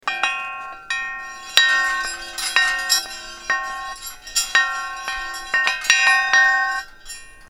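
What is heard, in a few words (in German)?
Metallgeklimper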